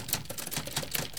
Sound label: type